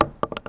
wood; Mafie 1

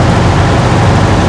truck; Mafie 1

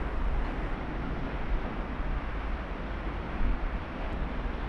ambi; Mafie 1

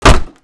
wood; Mafie 1

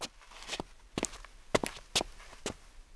steps; Ralph; Mafie 1 cz

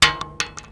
metal; Mafie 1

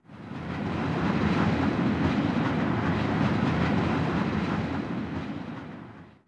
train; Mafie 1

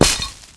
bush; Mafie 1

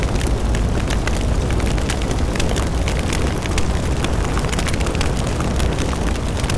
fire; Mafie 1

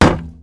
wood; Mafie 1

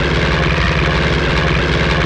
air; Mafie 1

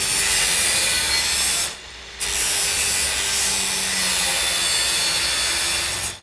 build; Mafie 1

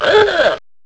horn; Mafie 1

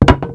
wood; Mafie 1

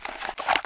pull; Mafie 1

